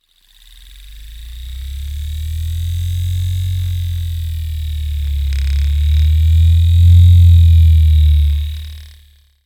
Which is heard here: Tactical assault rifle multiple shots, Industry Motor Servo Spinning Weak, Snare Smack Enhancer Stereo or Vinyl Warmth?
Industry Motor Servo Spinning Weak